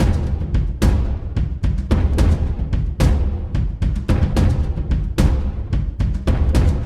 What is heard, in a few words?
Cinematic Drums 120BPM